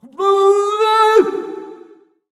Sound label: Swordcaster Old Attack Vocals